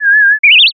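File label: uguisu, Japanese bush warbler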